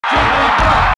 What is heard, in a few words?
Cheer NTSC; Jigglypuff; SSB